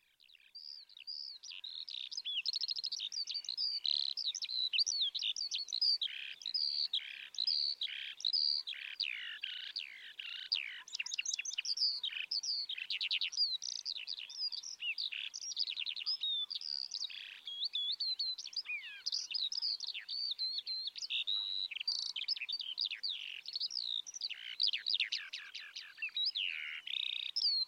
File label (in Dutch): veldleeuwerik